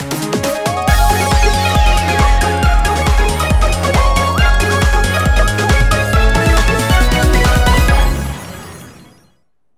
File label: Jingle Title; maimai dx universe arcade gamerip 2021